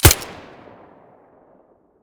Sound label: silencer fiveseven stereo, weapons